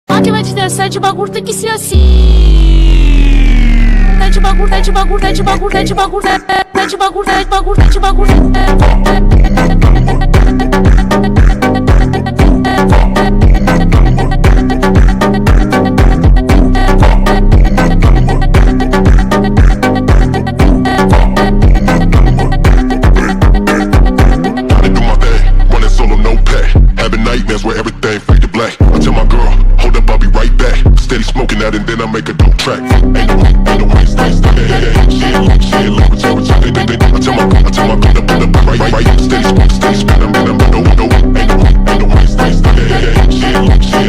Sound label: Phonk slowed